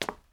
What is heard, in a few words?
FOOTSTEP CONCRETE